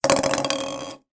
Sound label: foley baubles x3 decoration christmas down table; zapsplat